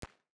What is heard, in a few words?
Bass Bin; Experimental; Ableton; Convolution Reverb